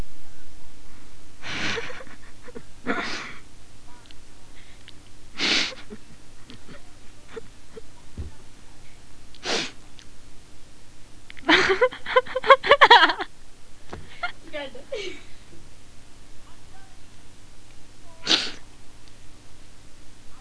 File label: laugh bout